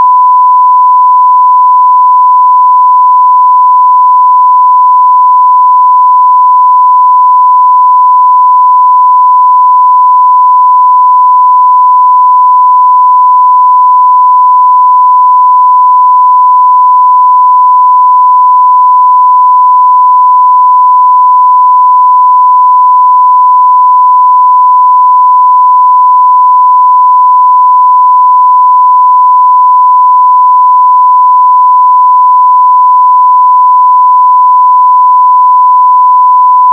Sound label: 1khz